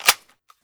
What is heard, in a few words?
mp5 Mag In